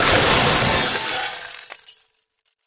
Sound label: Metal Wood Glass, mixed; Metal Wood and Glass